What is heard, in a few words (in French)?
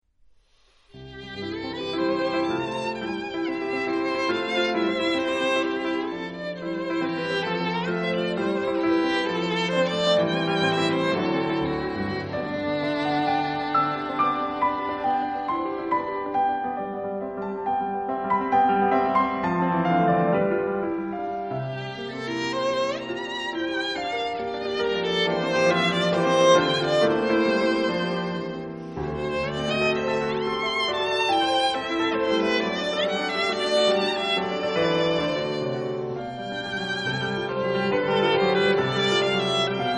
Sonate violon piano